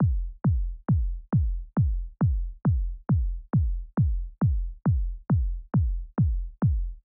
kick lo

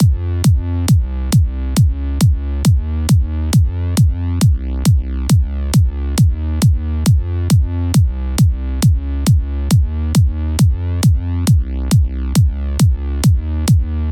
Bass and Kick

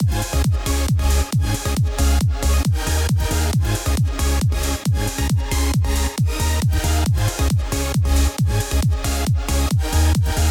EDM mix

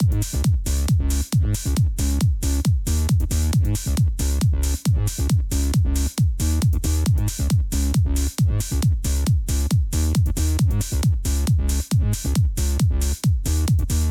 Bass mix

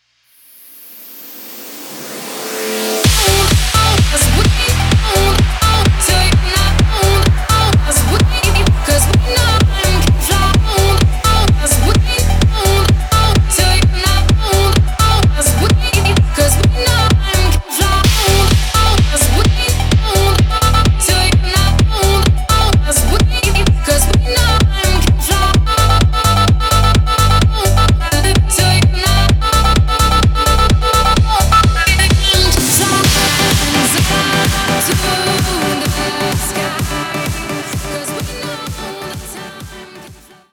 EDM Intoro Verse; イントロ部分; 映像制作およびイベントのオープニングBGM用として制作した

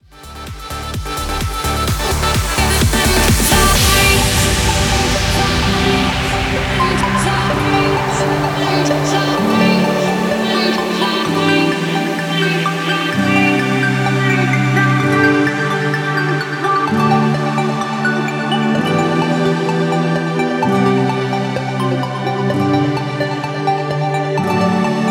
EDM break down; ブレイクダウン

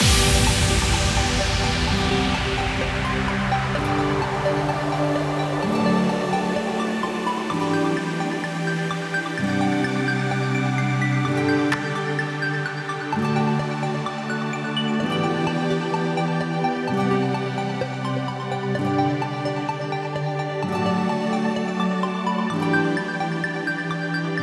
BD Pad Pluck Long; 更にスネアを入れたり、ハイパス・フィルターやローパスフィルターをグリグリやりながら盛り上げていく

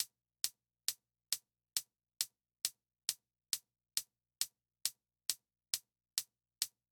kick high

